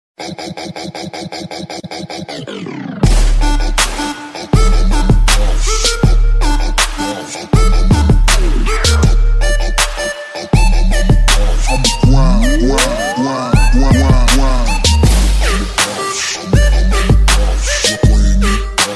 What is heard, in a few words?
boss ringtone